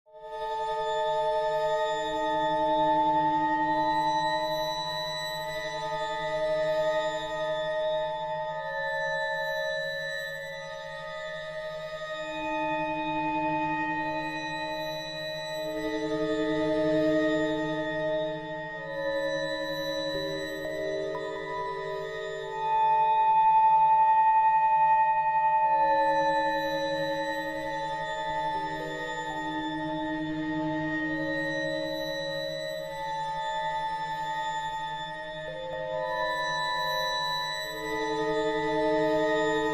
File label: Discovery Horror Soundscape